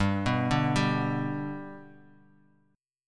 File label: G Major G down, chord; G arpeggiated; JGuitar